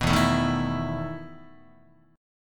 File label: E Major 7th Suspended 4th E down Guitar